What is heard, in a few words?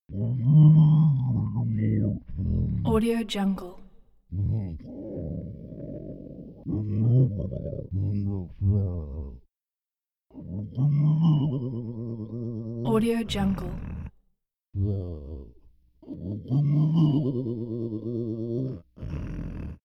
humanoid creature voice whimper grumble snort